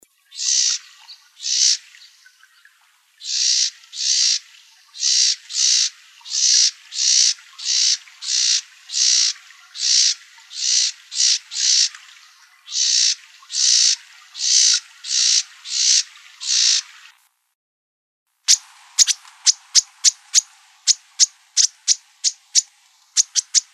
CALLS, Wrens; BEWR; deviche

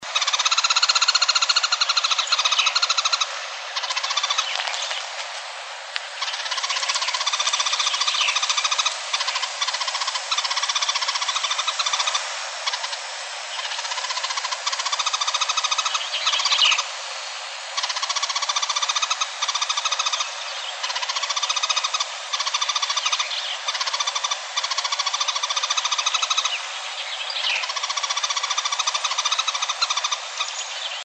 CALLS, Emberizids; deviche

